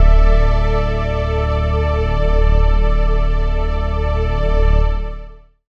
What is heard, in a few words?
Warm, Pads; Zero-G Jungle Warfare 1, 2 & 3; 90 s Sample CDs